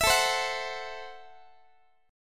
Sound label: A Minor 6th A, Guitar; Am6; strummed; chordshape; x,x,19,19,16,17